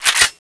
reload bolt pull, weapons; tfa cso

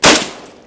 vickers stop, cannon; wac

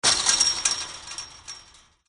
barbed wire move; gow; fortifications

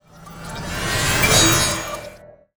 cast

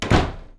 door close

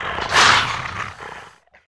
attack

